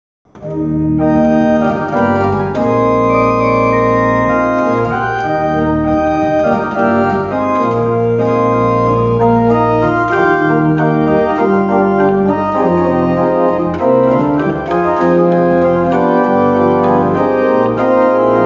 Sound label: Jazz-Pop